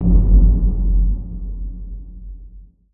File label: Bong One Shot; Type:Rider; PS Vita; Garamond Bong One Shot 02